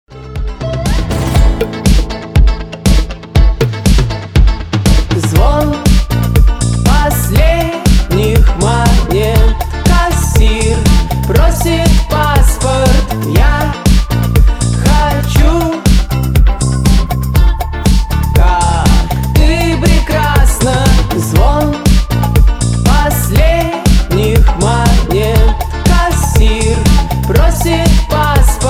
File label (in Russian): Тремоло